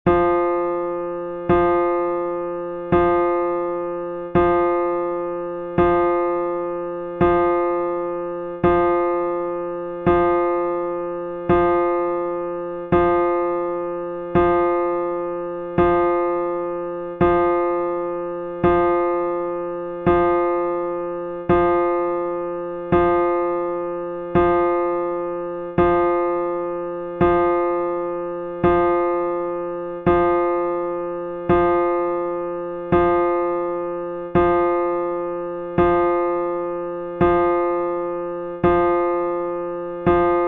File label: impulse; note resonances